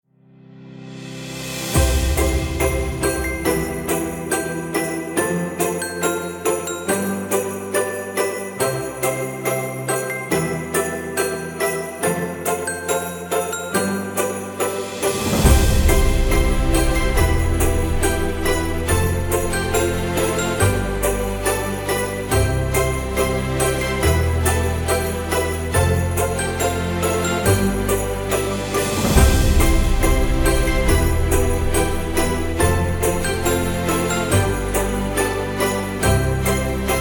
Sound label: christmas happy background